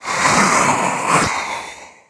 move

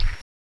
step; rheptor

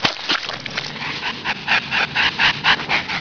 barnacle alert; gearbox

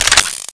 anaconda whirl; firearms

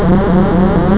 klaxon

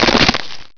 headcrab head bite; gearbox

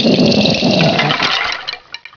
die; svencoop; hungerslave

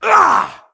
Horseman Attack; svencoop; keenhalloween; horsemen

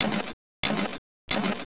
glock silencer; lightenwoods; silent zhildor demo

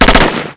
m16 3 round, weapons; svencoop

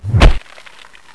pclaw strike, panther

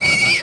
PAIN, aliens chestbuster; svencoop

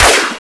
mini sentry gun

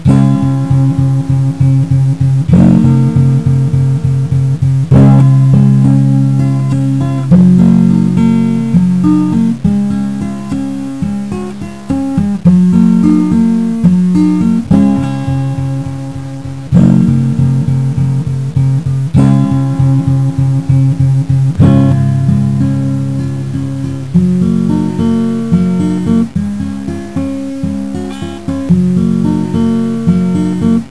music; tfc

